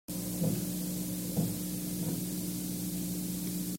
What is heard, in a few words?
footsteps in bedroom